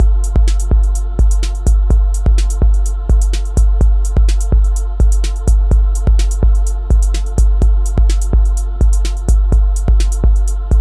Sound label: 126bpm loop